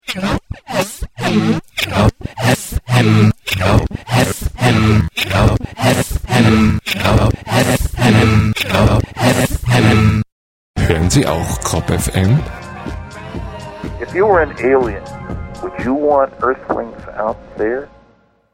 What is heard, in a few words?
jingle